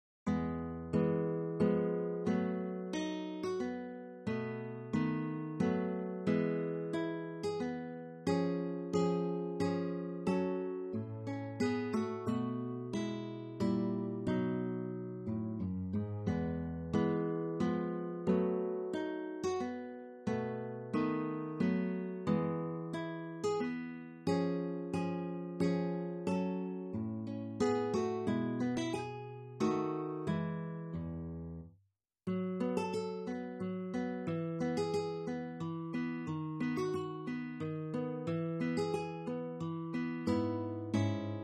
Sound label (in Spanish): minueto; ferrer jose